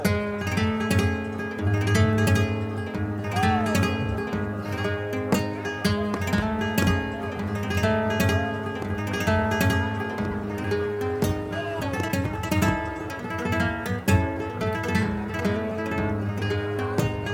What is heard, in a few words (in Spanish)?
Solea falseta